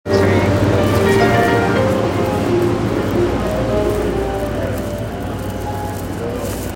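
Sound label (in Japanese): 山手線上野 counterclockwise, train sounds; JR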